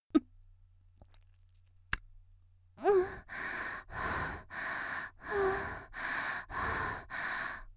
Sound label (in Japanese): ゴックン後、口を離して「んっ」「荒い息」; 綾波系少女